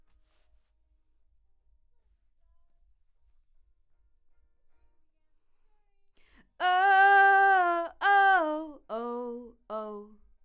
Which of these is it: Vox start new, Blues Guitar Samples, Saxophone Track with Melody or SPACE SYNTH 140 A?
Vox start new